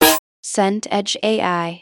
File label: snare rim